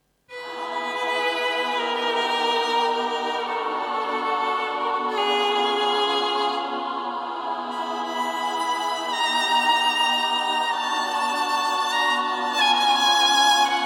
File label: Choir and Bohemian Violin Steinhofkirche Vienna; VSL MIRPro3D; EW Elves